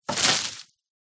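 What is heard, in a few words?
foley tree leaves palm bash against window exterior; zapsplat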